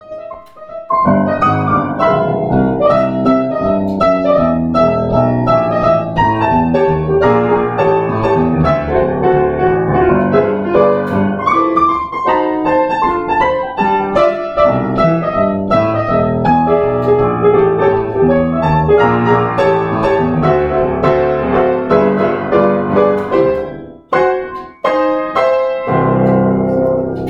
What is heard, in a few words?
Piano